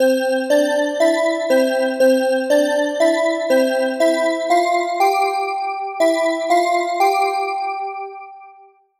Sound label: unison lock rand